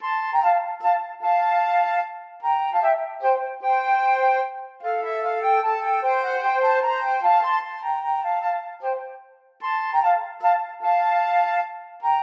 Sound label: duos menuett FL, flute duet